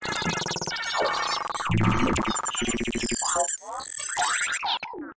science fiction cyberpunk computer equipment or machine chirps beeps glitches; zapsplat